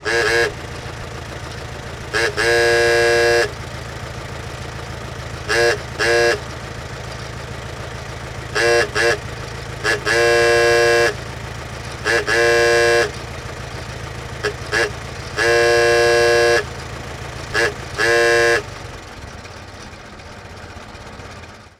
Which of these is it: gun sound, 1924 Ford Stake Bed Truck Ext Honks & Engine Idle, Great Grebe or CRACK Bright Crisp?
1924 Ford Stake Bed Truck Ext Honks & Engine Idle